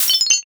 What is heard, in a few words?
dish break